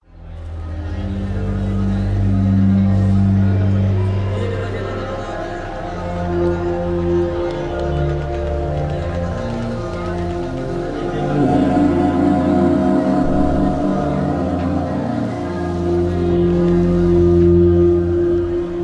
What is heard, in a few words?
Ambience; BlueDayAmbience